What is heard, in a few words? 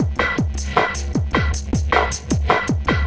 Noise Hop, Beats; Sonic Foundry (Sony Creative Software); Synchro Funk